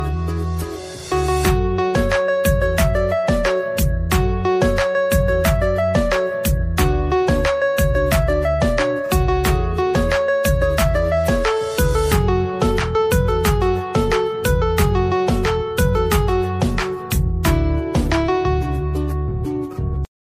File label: best flute ringtone, bollywood